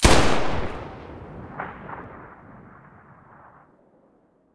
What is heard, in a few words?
muzzle fn2000